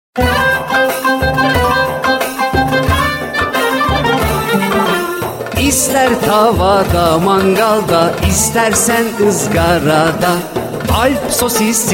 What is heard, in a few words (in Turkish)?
vokal